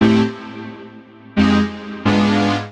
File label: Brass Wave A Minor 88 bpm; MIDI Clips; Tonal; Accompaniment; Ableton; Factory Packs; Chop and Swing